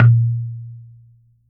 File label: coconut; Unique Instr